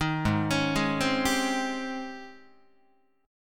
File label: G sharp Major 11th G sharp, Guitar; JGuitar